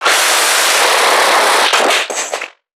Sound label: NPC Creatures Vocalisations Infected; Five Nights At Akers; soma; npc construct